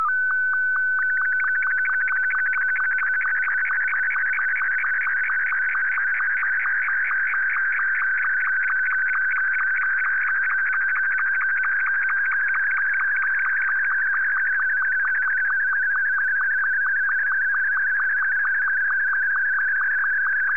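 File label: slow-scan television; SSTV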